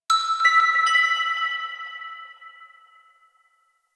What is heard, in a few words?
Mallet; Warframe; Ambience; Clan Dojo; Dojo Randoms